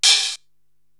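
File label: HH Open, hi hat; Korg TR-Rack; Drum Machines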